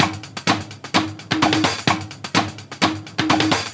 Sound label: Latin House Loop 128 BPM; Vengeance Samples